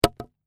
Wood Hit Wooden Impact Settle, Wood drops on wooden planks; slight wood rattle and settle; Wood Sound Effects